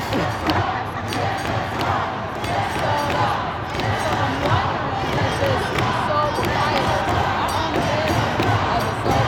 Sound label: let us talk chant